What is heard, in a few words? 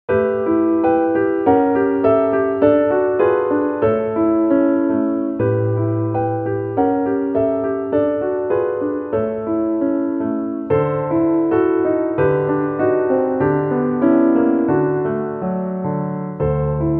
piano; schumann